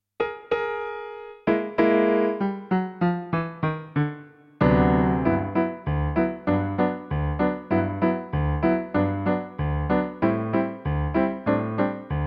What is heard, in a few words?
karaoke